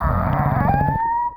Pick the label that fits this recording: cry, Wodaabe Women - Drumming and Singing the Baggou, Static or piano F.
cry